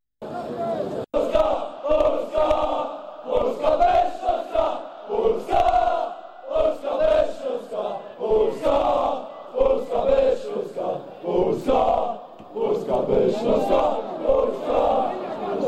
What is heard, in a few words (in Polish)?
spiewy; polska bez slaska